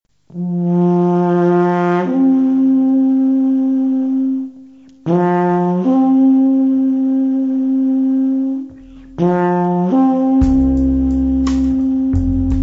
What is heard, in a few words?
midnight horn; live in LA